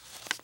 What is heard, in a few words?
Notebook SelectPaper; Lucius III